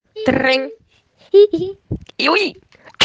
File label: tring hihihi